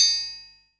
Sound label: triangle; AKAI S6000 CD-ROM - Ultimate